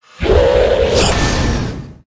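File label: Rat buff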